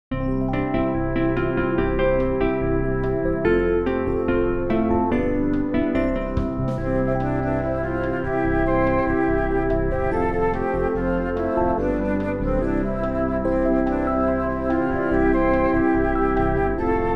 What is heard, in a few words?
instrumental; New Hope Music